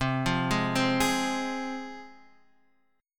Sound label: C Suspended 4th Sharp 5th, C, guitar chord